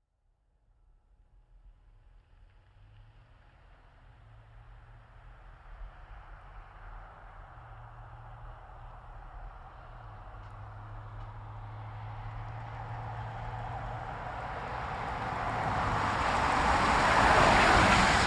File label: Truck GMC Savana By Corner Slow; 2010 GMC Savana; Trucks (Sound Effects:Vehicles); Big Room Sound